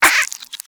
Vocal Tranq Grunt

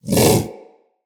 Predasite Vocal Bark; Characters; Hunting Critters

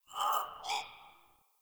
Tree Critters Angry; Duviri

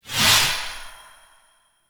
Hand Projectile; Duviri; Lotus Hand; Weapons

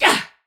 Attack Effort; Warframe; Tau; Dax; Ninja

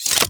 Crossbow Reload Insert Clip, Weapons; Warframe; Tenno; Garuda

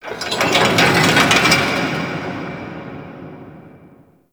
Turret Moves, Fortress Ambience; Warframe; Grineer

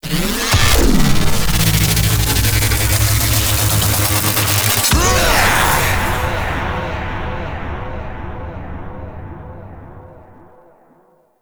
Assassin Bait; Warframe

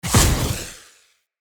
Corrupted Battle Dog Sub Boss Proj Launch; Combat

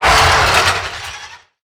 Melee Attack; Necramech; Battle Barks

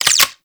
Reload; Warframe; Weapons; Spectra

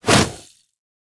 Fist Swipe; Warframe; Pacifist

